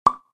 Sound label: generic button; Candy Crush Friends